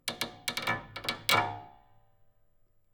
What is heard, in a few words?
prepared piano string